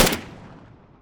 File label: tmp shoot end, weapons